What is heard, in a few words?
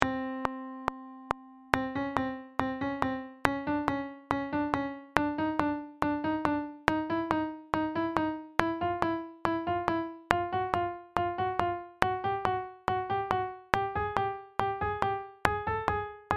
pitch training up